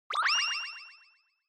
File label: Motion Blow Away Short Delay